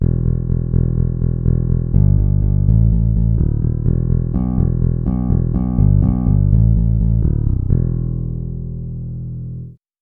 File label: fingered bass bass